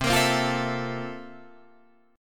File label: C 13th C down Guitar Drop D